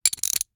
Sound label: Rachet, vanadium steel, 8in, standard, locking, clicking, mechanical, new, socket lock, rotate; Eiravaein Works; Vaeyan IV